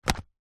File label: wallet leather set down on hard surface; foley; zapsplat